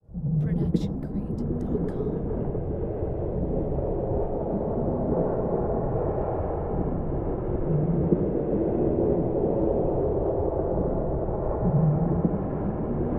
underwater ambience; soundscrate

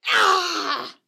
woman injured grunt

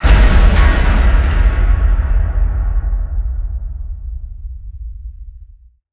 anime explosion metalic

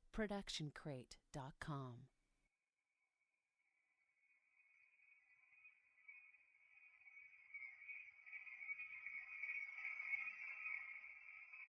reverse shimmer no tail; soundscrate

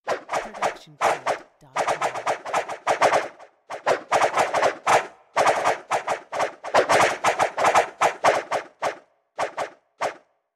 arrow swooshes multiple

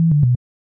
click fx tonal; soundscrate

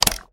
switch click; soundscrate

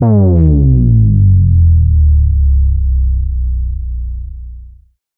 Bass Drop; SoundsCrate

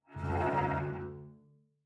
Cello Shivering Tremolo; SoundsCrate

